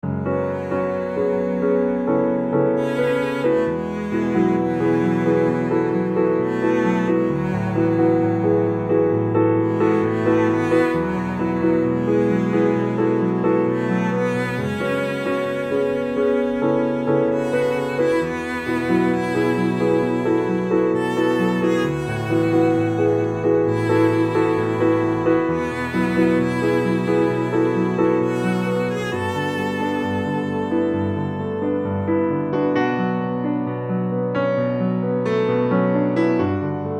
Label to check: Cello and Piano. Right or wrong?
Right